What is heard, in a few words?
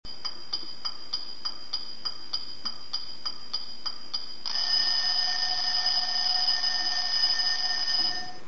Clock